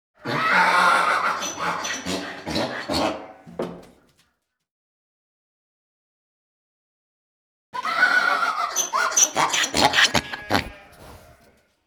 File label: Animals Birds; Sound Ideas 6003